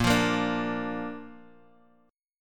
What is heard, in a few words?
Bb Major, Guitar; 6,8,8,7,x,x; 4E,4A,5D,5G,5C,6F; Bb strummed; JGuitar